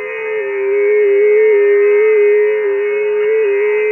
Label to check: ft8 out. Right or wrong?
Right